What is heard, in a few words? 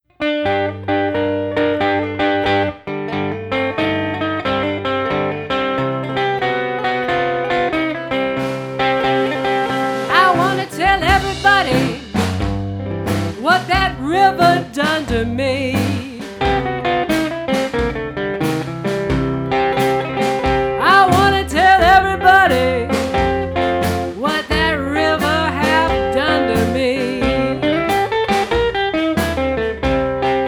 Blues